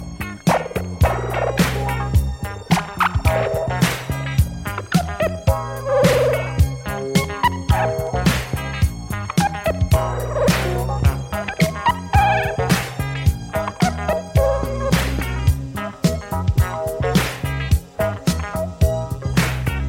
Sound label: Rap (instrumental), Hip Hop